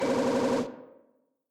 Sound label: item get; dante